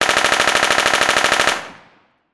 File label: gunshots machine gun burst; sound effects one